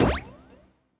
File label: Bouncy Bubble Bounce; Subway Surfers City Sound Effects; Android